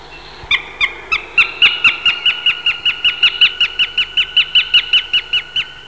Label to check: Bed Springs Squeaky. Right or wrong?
Wrong